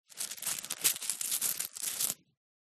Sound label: rummage in wallet